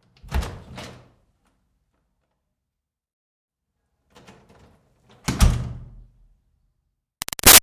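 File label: Door Wood Church Basement Room Open Close Medium; Miscellaneous Doors; Door Wood Church Basement Rm1 O-C Med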